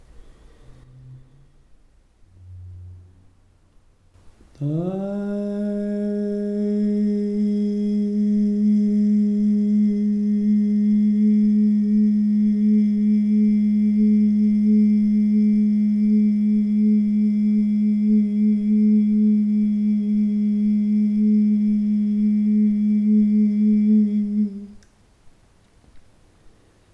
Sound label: Chakra tune Vishuddha